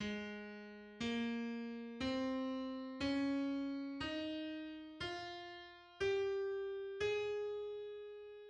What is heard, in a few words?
Ab major scale